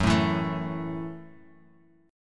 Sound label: Gb Minor 7th down; strummed; JGuitar